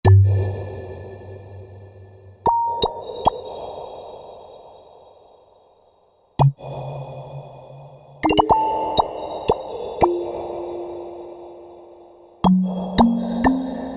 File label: Droplet